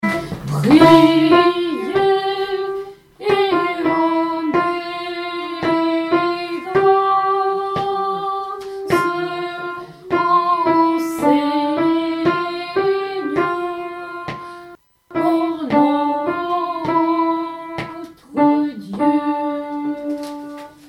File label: Alto; Prokimenon (mélodie alto)